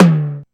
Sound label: Tom, LinnDrum